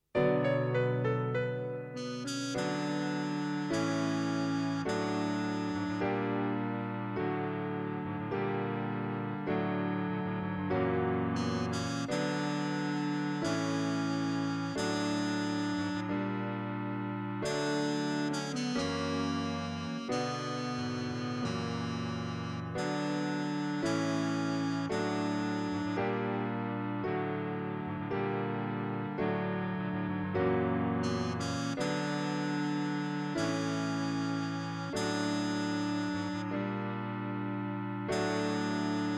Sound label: instr part; Annie; rehearsal materials